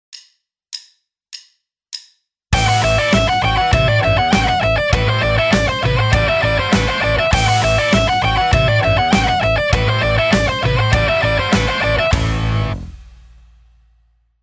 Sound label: Alt picking rock lick, guitar; 30 day guitar challenge